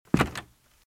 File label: Footsteps - Wood - Creaky - Walk - Right; RadwinIsland